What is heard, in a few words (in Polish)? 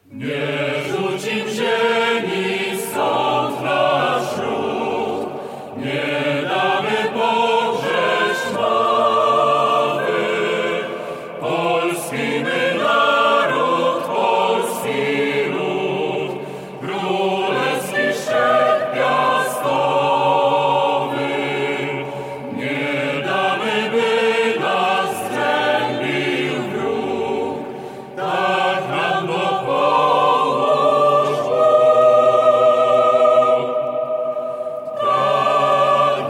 Rota Chor; Canticum Gratiorum; NSN 2021